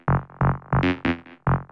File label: Bass; Techno Music Maker